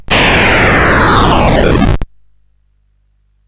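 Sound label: Moon Patrol, MOS 8580 SID chip music, games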